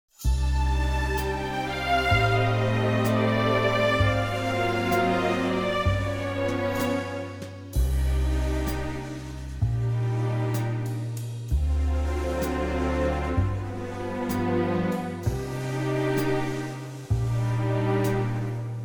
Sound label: Cm orchestral